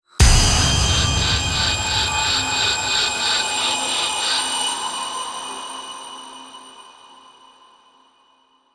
Tension Builder Impact

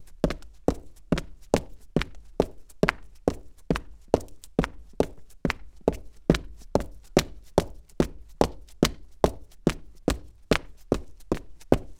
Cement Dress Boots, Footsteps

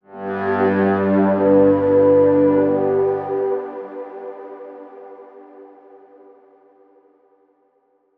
Brass Morning

